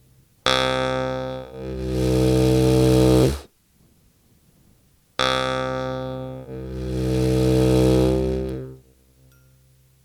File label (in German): Harmonika